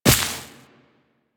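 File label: Step Vegetation, Footsteps, Walk; DE; Warframe; Tenno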